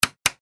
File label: switch